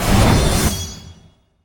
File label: lunge; smite; horus